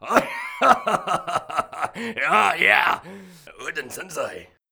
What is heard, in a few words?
Boot Sound; Wooden Sen'SeY; Wii U